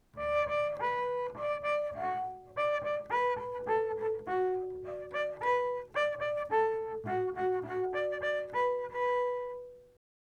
Bowed Harmonics Quick

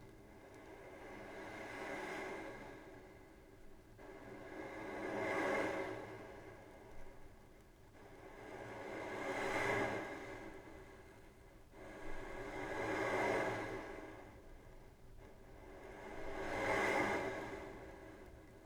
Bowed Brushing On The Bridge

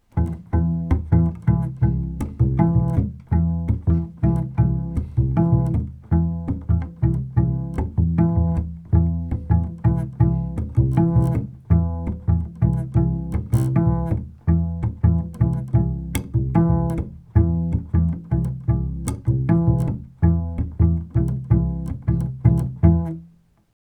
Audio Double Stops Soft Groovy

